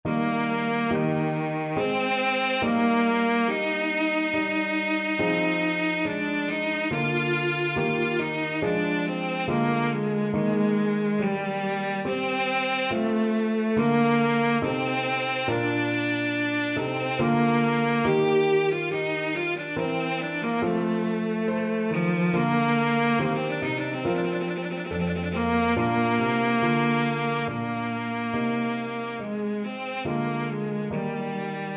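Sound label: violoncello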